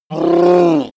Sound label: reptile choke call short